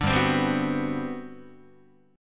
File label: G Major 11th down Guitar; strummed; JGuitar